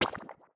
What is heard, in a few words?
Slime Hit; Enemies; Battle; Babushka